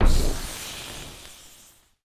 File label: onager hit ground